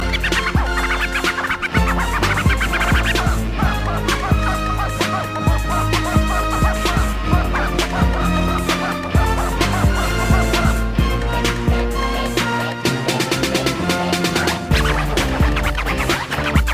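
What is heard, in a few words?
Scratching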